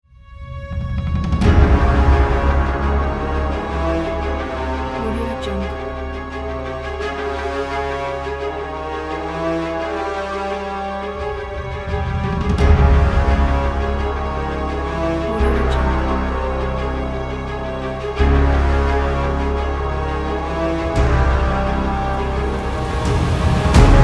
majestic villain; cosmicradio

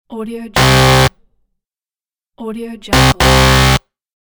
wrong answer error sound

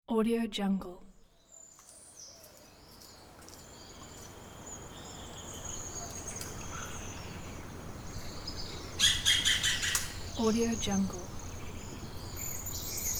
caribbean countryside morning ambience

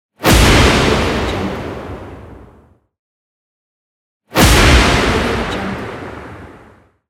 water explosion; phillipmariani

